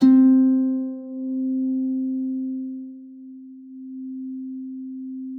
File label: B3, HARP; Xsample VOL-8; HARP P+MF